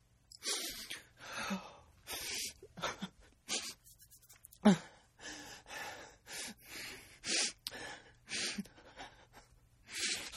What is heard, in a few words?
Crying Sniffing Male; Sniffling Sound Effects; Human